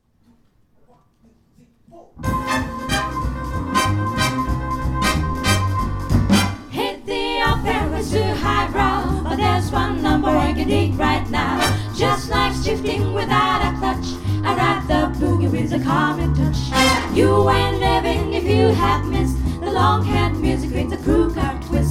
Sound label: Boogie